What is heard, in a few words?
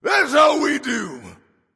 yay; smite; ravana